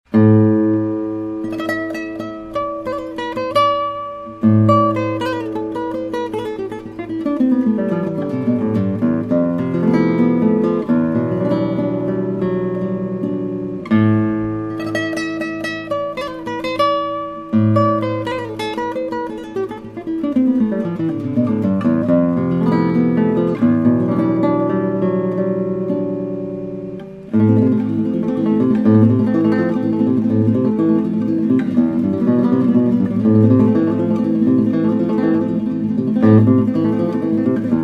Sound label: ramirez elite; nylon string guitars; classical guitars; concert